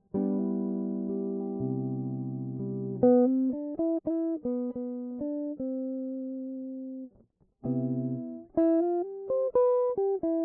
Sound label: Mellow jazz